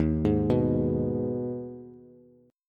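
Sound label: E flat Suspended 4th Sharp 5th, Bass; Ebsus4#5 arpeggiated; JGuitar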